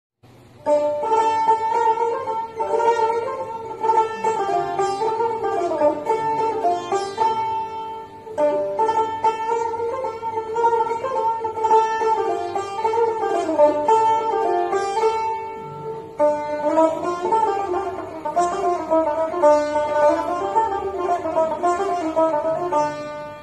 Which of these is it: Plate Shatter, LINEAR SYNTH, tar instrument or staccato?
tar instrument